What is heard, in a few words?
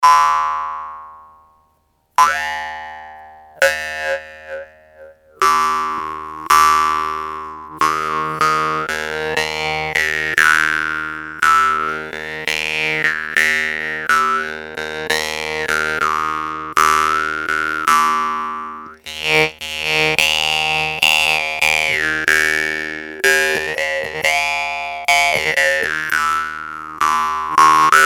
brass